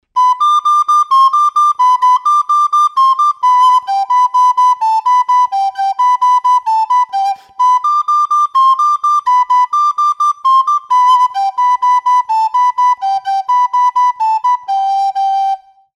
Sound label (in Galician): trad, Pito Galego